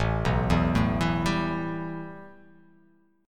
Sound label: A 7th Suspended 2nd